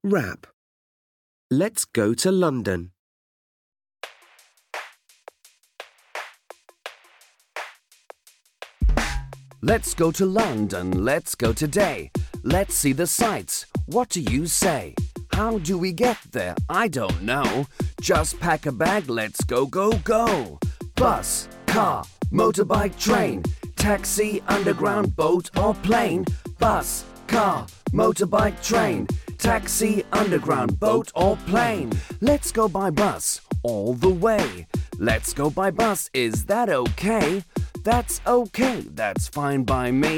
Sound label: London Rap